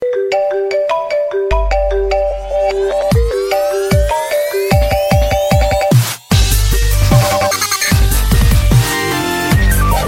iphone marimba remix ringtone

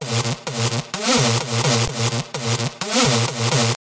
amplitude bass ringtone

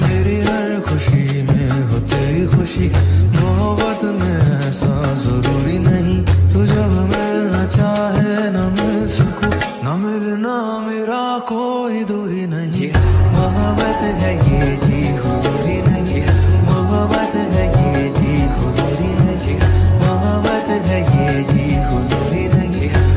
bollywood ringtone; bollywood ringtones

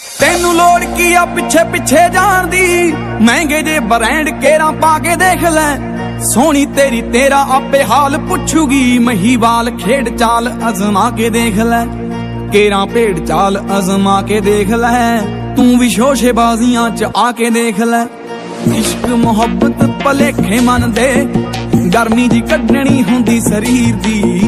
punjabi song ringtone

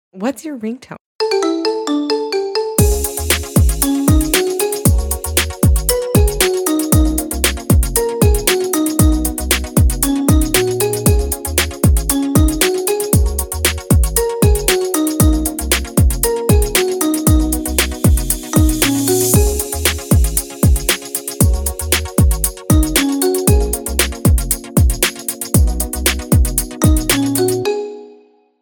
marimba remix ringtone

